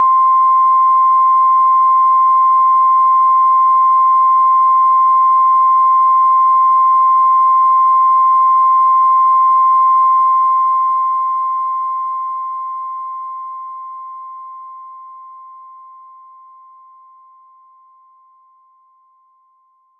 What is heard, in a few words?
Unused Jingle